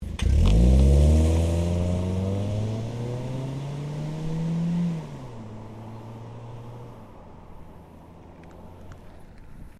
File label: Car Ford Focus Drive Off; Ford Focus hire car; Cars; Vehicles; SoundEffects-Foundation1